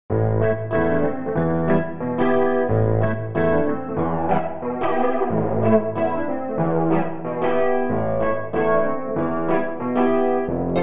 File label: chorus-flanger